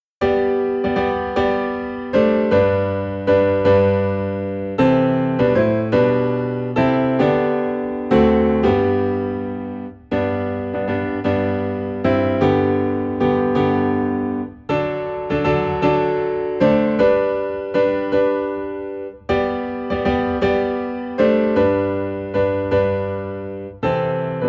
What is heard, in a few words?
Piano in G; More About Jesus